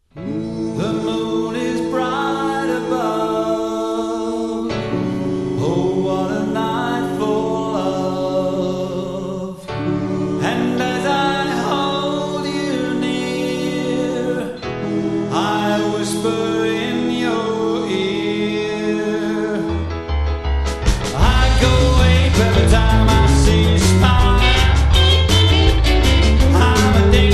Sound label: Rim Shot